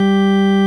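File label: ORG Vox G, Continental organ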